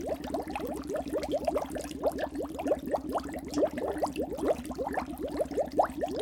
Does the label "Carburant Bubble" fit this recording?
Yes